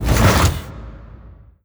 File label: entry whoosh, dog; npc; scripted